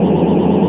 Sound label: crystal pods, movers objects